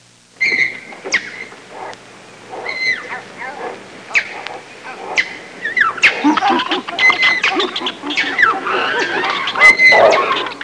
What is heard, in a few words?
Younge jungle chimpanzee noises